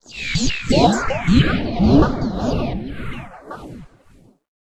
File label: SCI-FI FX; Best Service; XXL Production Effects; Sample CDs